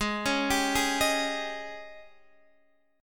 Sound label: Ab Major 7th Suspended 4th, Guitar, Standard; arpeggiated; x,11,11,12,9,11; AbM7sus4; JGuitar